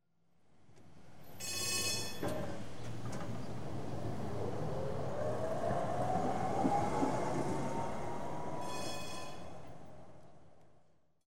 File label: tram ext doors close with bell, departs; Transport; BBC Sound Effects Library